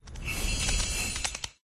Deer; Pet

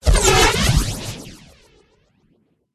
worm

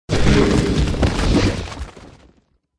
golem death

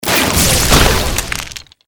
Pet Die; Dzerug; dzerik

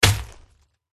archer attack contact middle

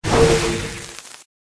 Pet Elemental Fire Die

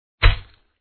sheep attack contact middle

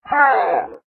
attack voice bottom; gordt

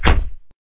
wound punch

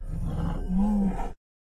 Tiger Stun

